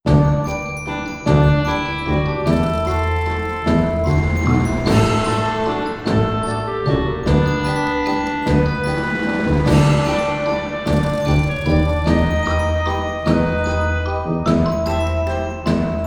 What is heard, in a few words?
stereo MUSIC only